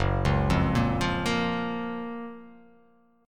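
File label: G flat Major 7th G flat; GbM7 arpeggiated; JGuitar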